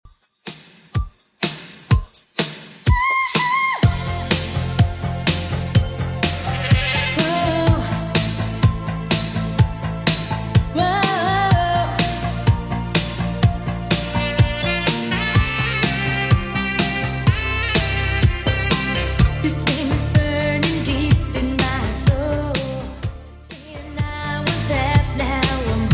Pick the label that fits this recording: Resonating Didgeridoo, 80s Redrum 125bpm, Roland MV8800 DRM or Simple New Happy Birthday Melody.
80s Redrum 125bpm